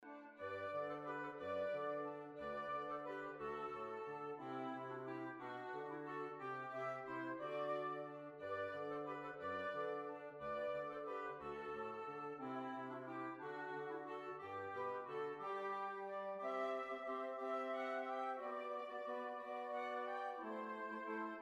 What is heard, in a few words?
String Quartet